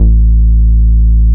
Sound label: JUNGLEBS A2, JUNGLE BS